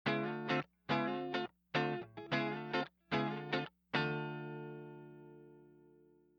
fast strumming dry

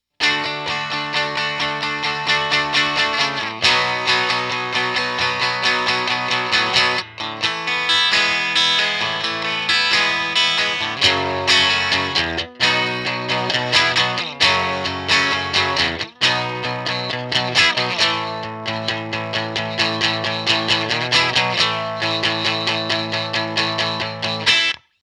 riff treble boost; tone attack

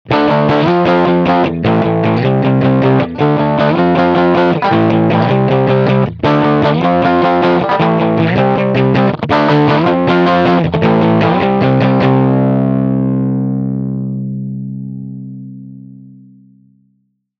light fuzz riff

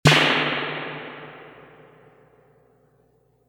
reverb tank 3 spring medium drum machine snare

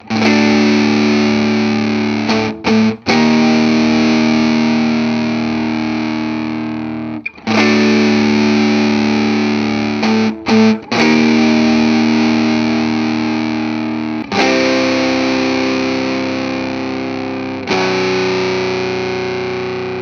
saturator heavy riff; level 3 oclock; soak 12 oclock

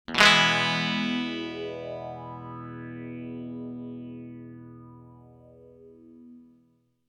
strum slow psychedelic

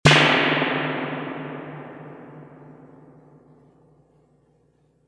reverb tank 3 spring long drum machine snare

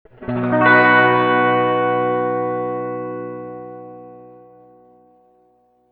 reverb tank 3 spring long sustained chord

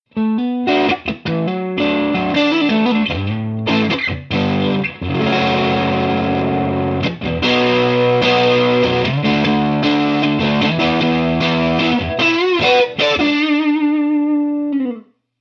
overdriven

